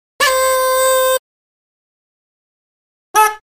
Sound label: Horn 2 Toy Horns Honks